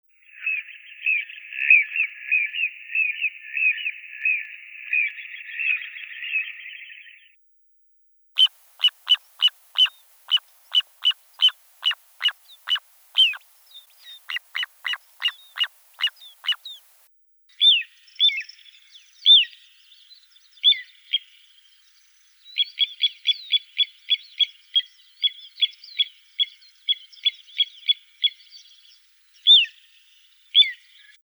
Charadrius dubius

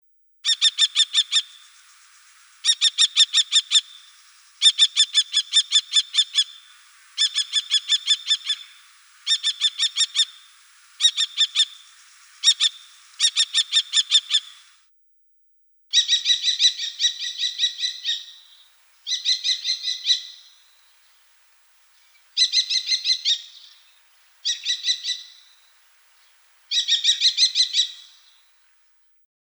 Falco tinnunculus

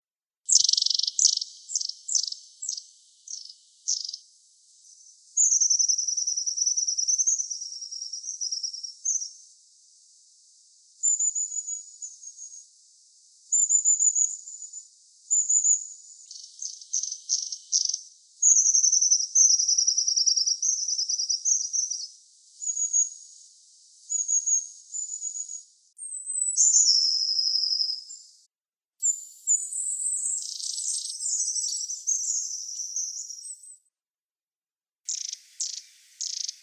Aegithalos caudatus

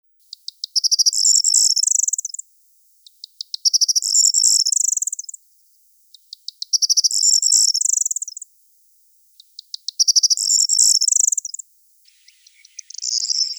Emberiza calandra

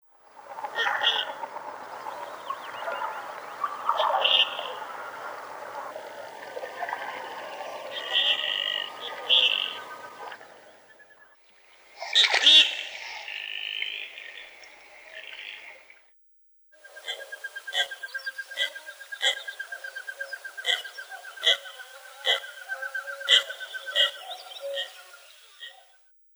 Bucephala clangula

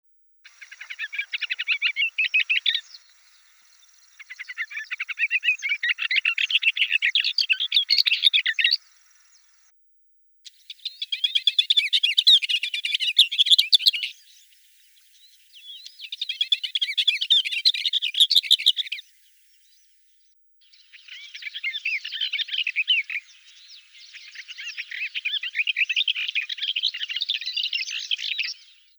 Iduna caligata

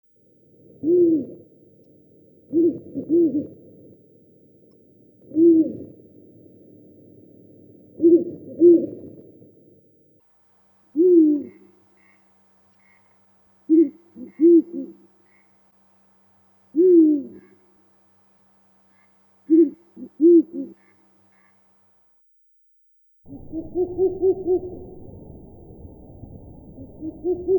Strix uralensis

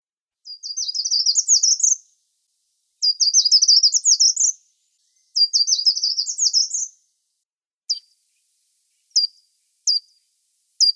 Certhia brachydactyla

